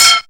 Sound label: Perc FX, Percussion; Best Service ProSamples vol.37 - Dance Synths